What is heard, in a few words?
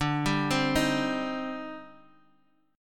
C Minor D; Cm/D arpeggiated; JGuitar